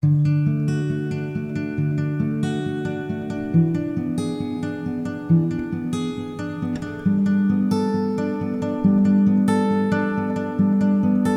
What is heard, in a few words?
Wedding Song, Wedding Singer, hymns